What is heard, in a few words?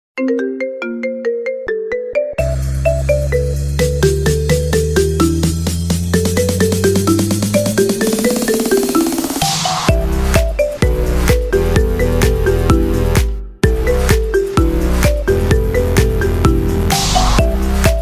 Marimba Ringtone